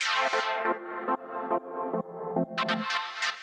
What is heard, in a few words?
TECHNO 140; Techno2